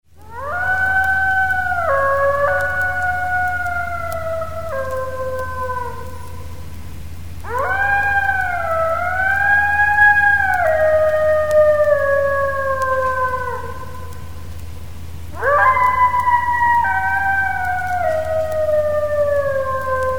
zhutkie zvuki

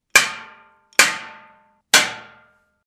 zvuk udara po metallu

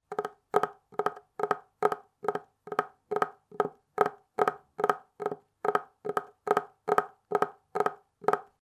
stuk palcev

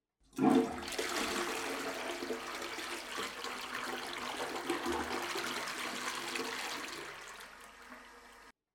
zvuk unitaza

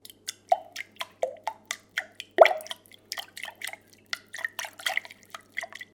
plesk vody zvuk

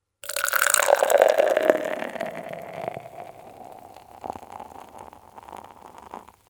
zvuk gazirovki

